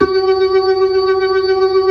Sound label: B-3, Blues